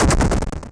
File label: stone hit